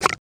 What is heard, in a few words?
mud